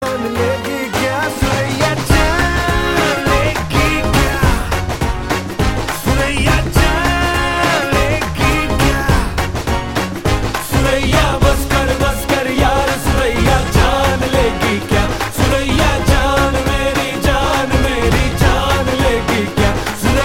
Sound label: telugu tone ringtone